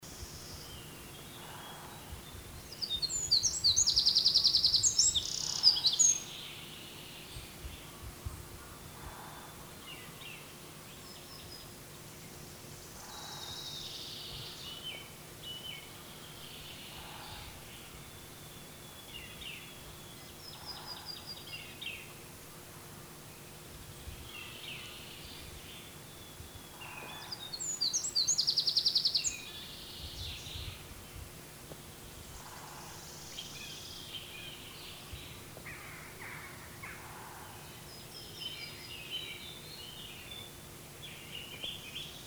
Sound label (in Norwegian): gjerdesmett troglodytes troglodytes troglodytes